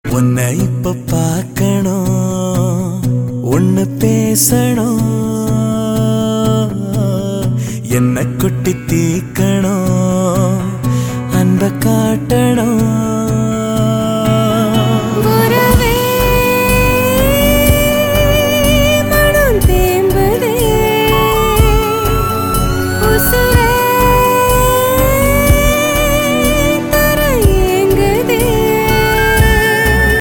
male ringtone

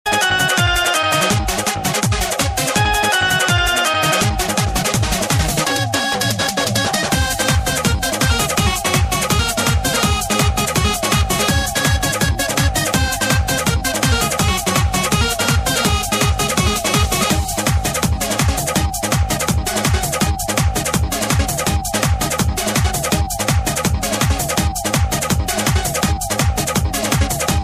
music Bhojpuri ringtone

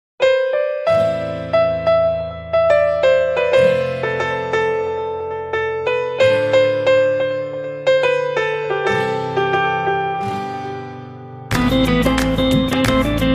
piano ringtone

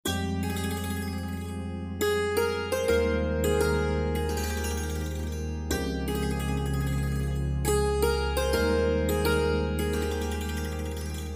instrumental ringtone

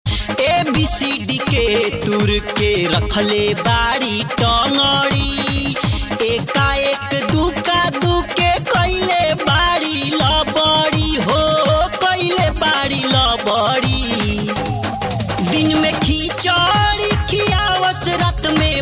BHOJPURI RINGTONE

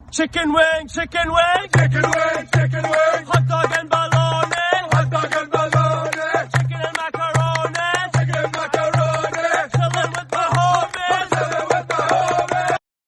Arabic version ringtone

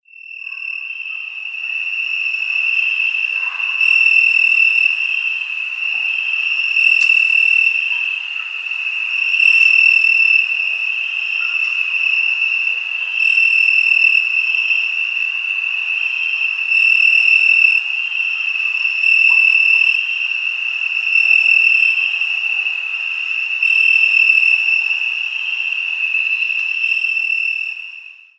crickets chirping sound

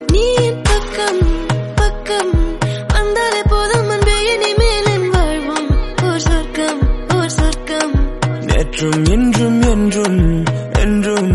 Tamil version ringtone

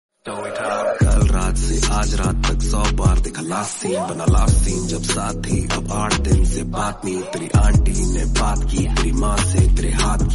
new rap song ringtone

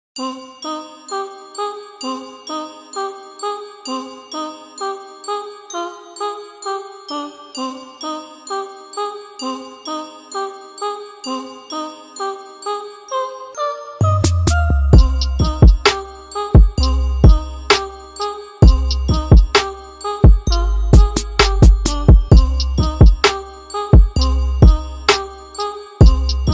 instrumental ringtone

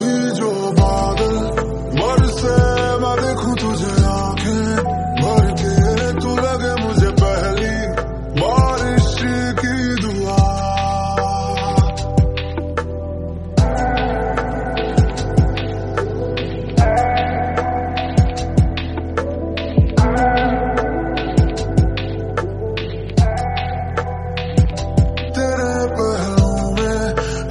LOFI RINGTONE

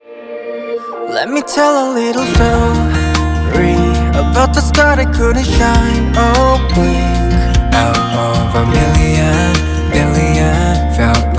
Korean ringtone

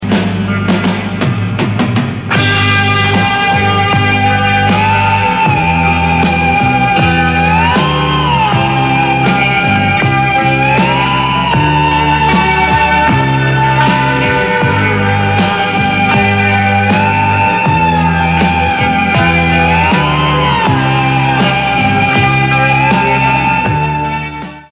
INSTRUMENTAL RINGTONE

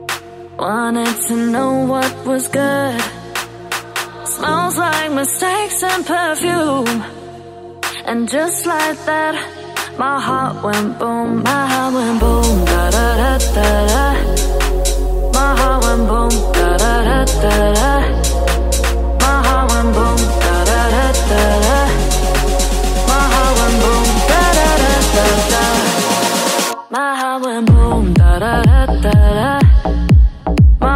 strings ringtone

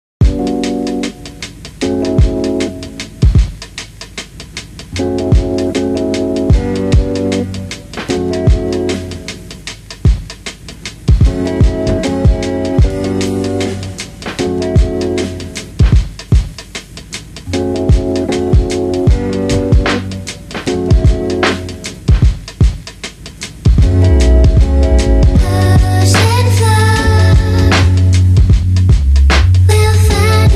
instrumental ringtone

